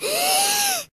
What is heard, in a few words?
Adrenaline End, Stamina ending sound effect; Die of Death